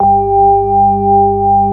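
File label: CX3 organ G1 L, Keyboards Old School; USB Soundscan vol.09 - Keyboards Old School; AKAI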